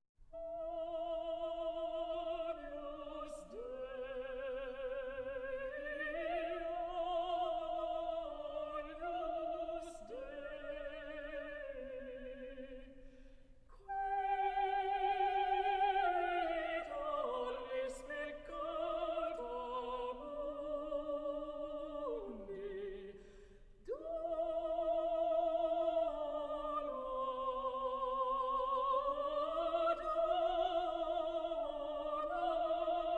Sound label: Agnus Dei soli; requiem verdi